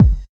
dope bass drum a key, Royality free bass drum one shot tuned to the A note. Loudest frequency: 137Hz; kick drum samples